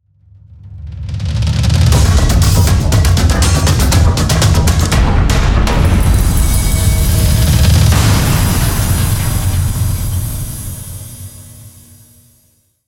Dark Jingle

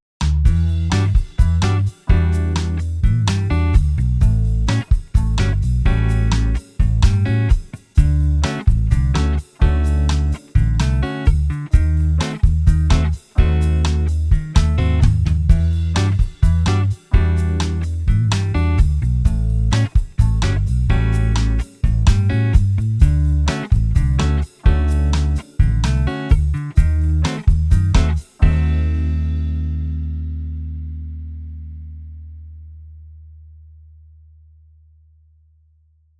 Elevator Music

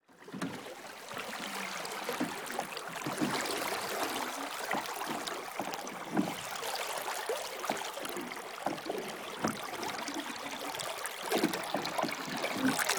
Water lake

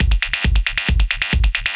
Tech loop

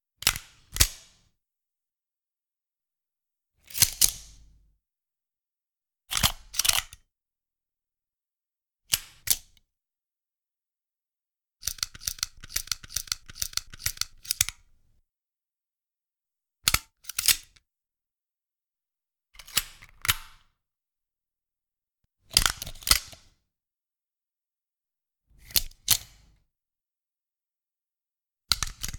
Gun Reloading Video Game

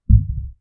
A Hand That Touched A Balloon

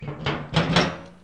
Heavy Metal Cover

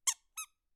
Rubber Duck Single Squeak

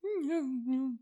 Hum hum hum

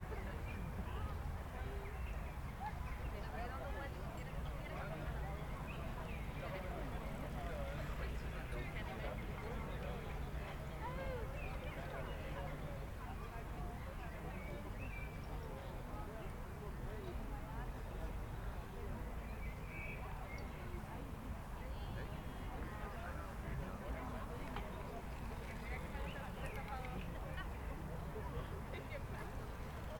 People Distant

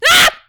Short Ah Yell

